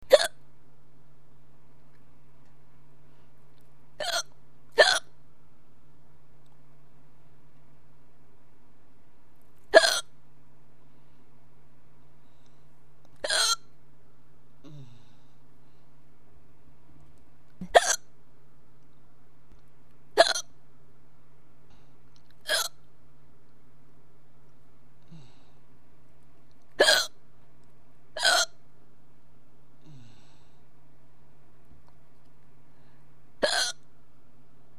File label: Sexy Hics